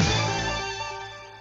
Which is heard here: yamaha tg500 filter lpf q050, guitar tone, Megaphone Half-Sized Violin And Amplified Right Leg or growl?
guitar tone